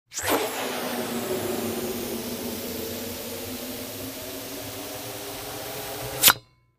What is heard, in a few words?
Small Rubber Balloon; balloons